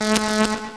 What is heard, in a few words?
buzz